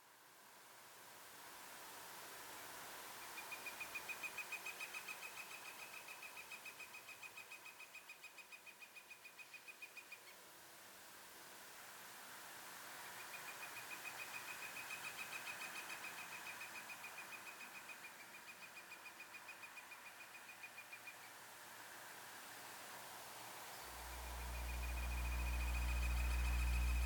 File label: DRONE whirling, waterline